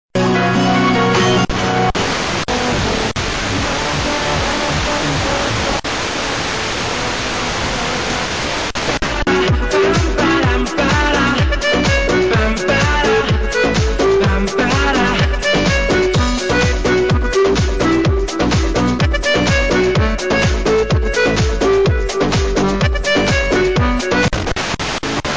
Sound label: 85 110 MHz